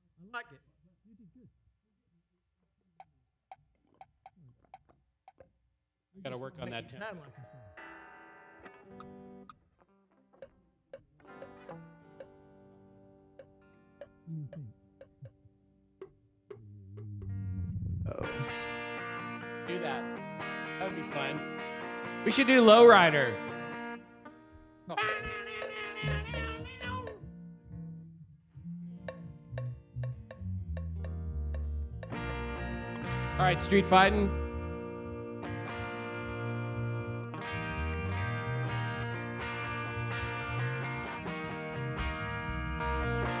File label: Vocal Emphasis Mix